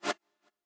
chord

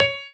piano melo

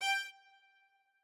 strings

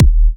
Kick; WAProd Free Kick Samples 2018; WA Kick Pack 3

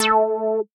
synth

